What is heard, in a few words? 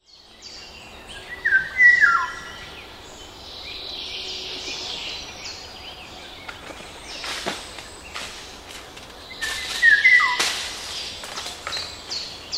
golden oriole